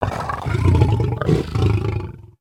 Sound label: Pet Lion Growl; creatures pets